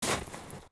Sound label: FS Snow, footsteps